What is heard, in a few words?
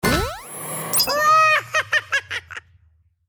boost helmet